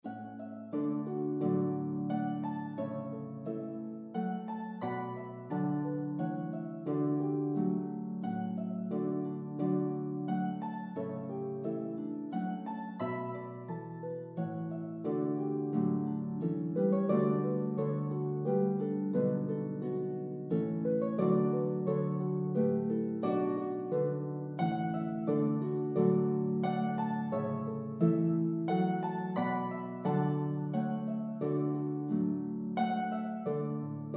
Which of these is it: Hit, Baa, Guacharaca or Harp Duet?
Harp Duet